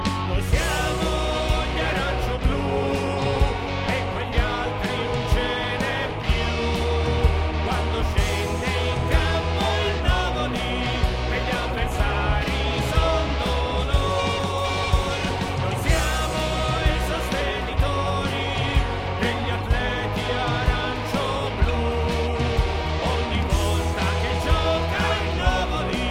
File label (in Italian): Inno Ringtone; Novoli